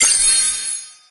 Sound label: emit starkeys; Brawl Stars